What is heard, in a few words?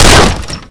shutter burst; Modern Warfare